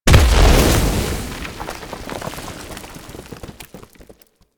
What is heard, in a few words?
Inferno Comet Hit; Warframe; Ember